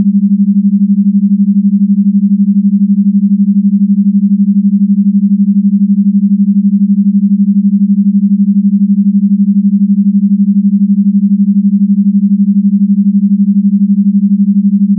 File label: binaural alpha